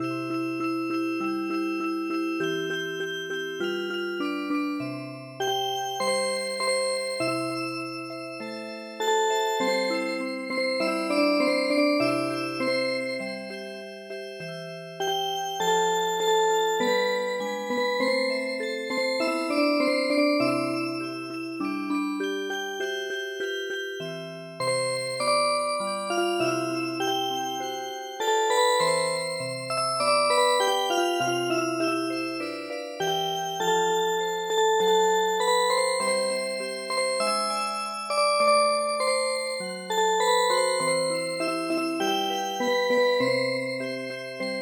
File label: Music Box